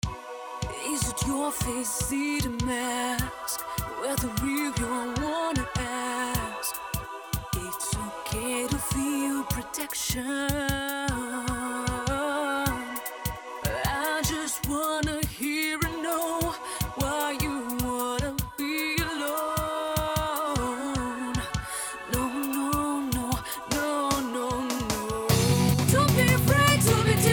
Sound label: Pop-Rock